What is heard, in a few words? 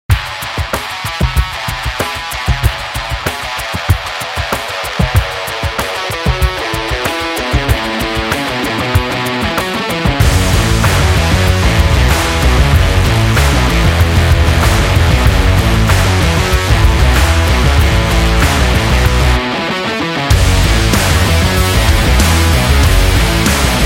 Sport Rock Trailer